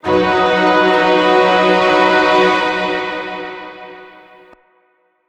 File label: String Ensemble B Maj